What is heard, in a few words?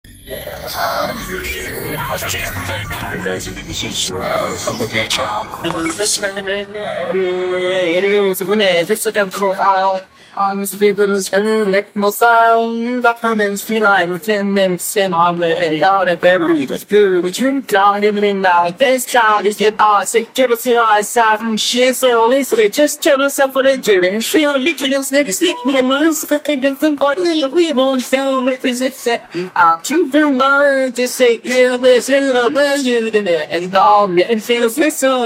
buzzy viola debut, string instruments